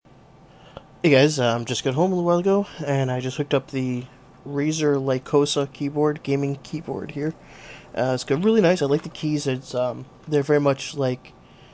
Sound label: new keyboard